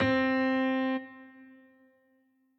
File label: piano riff intro